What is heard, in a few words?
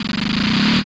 drumroll short